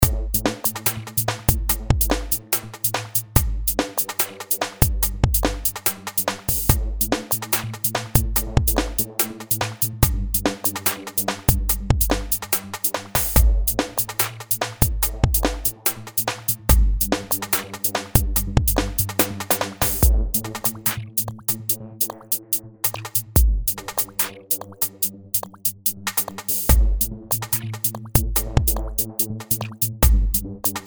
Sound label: Punch Film TV